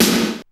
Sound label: DR550 REVB S; 90 sSampleCDs; Crypton - DrumBase5000